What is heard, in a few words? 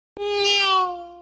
neoooom